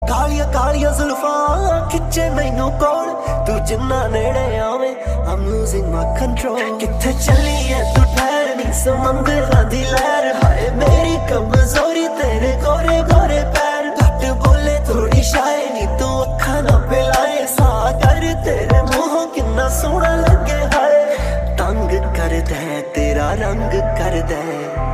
Ringtone

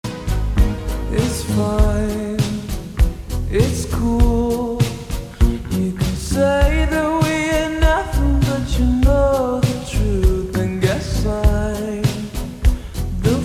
Ringtone Slowed Reverb

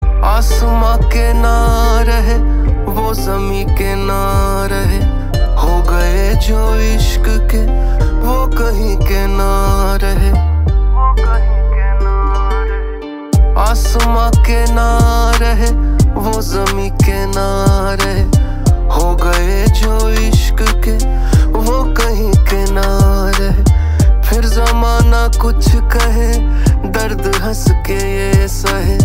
Ringtone

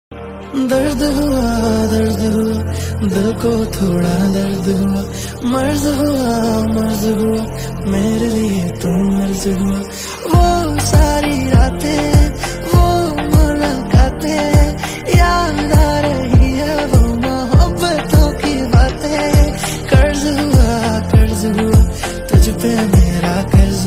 Ringtone

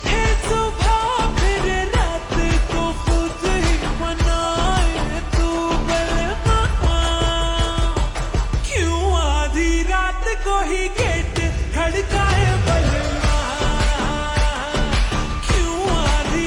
Ringtone Slowed Reverb

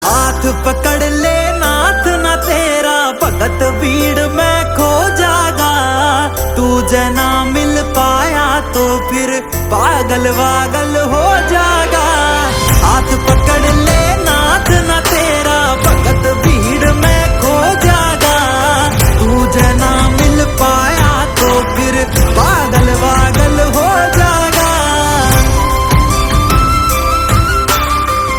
Ringtone

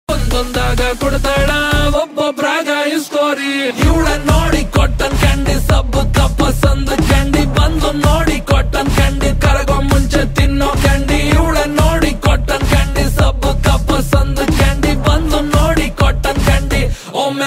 Song Ringtone

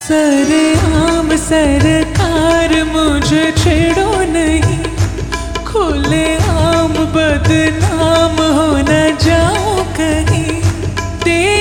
Slowed Reverb

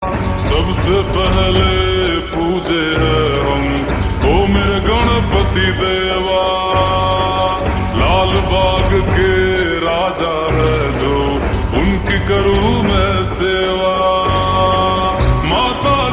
Ringtone Slowed Reverb

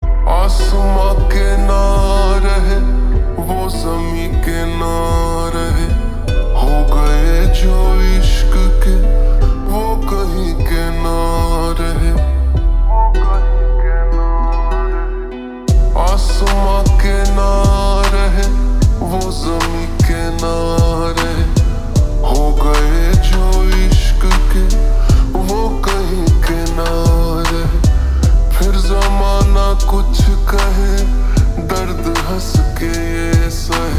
Ringtone Slowed Reverb

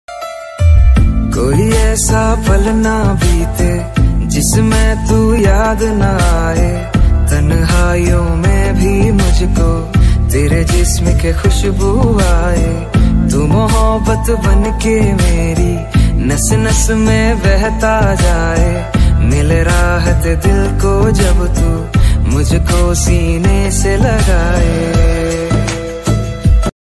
Ringtone

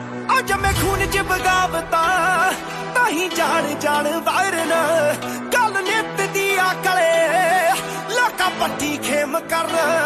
Ringtone

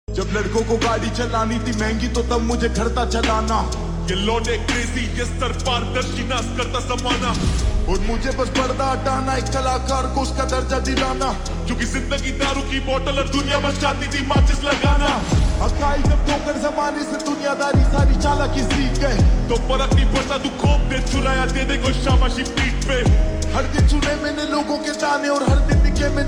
Slowed Reverb

